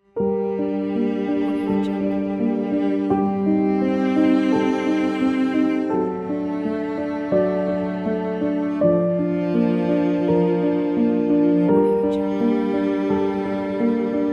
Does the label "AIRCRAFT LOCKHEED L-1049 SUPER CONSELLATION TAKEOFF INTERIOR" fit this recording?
No